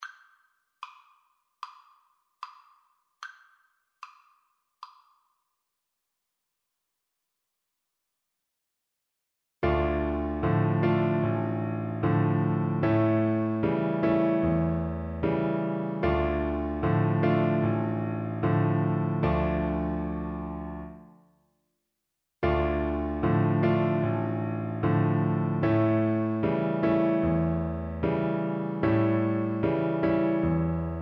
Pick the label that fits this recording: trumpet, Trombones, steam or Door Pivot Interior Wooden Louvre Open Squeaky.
trumpet